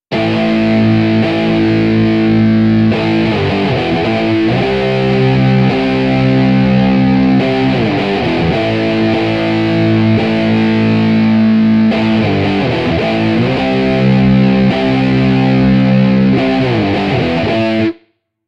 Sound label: Guitar Dub